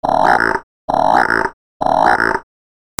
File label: ANIMALS